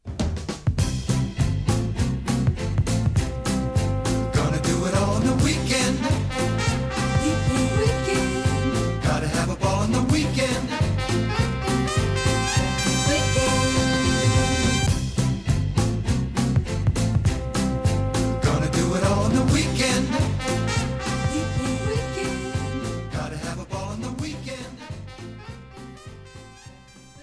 topformat maxi jingle single

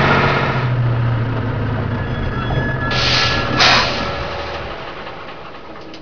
TRUCK; motoren

